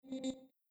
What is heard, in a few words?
error; status desktop